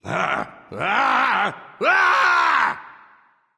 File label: nv fear, vox; smite; voicepack; vulcan